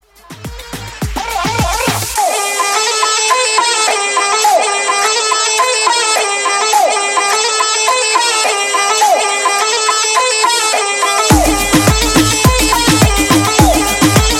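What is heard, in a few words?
Moombahton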